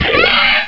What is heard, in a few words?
monster death; alienshooter